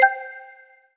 ping loot

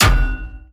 metal bullet hit

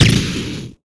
wand fan fireballs

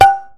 pan hit

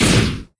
wand burst shot

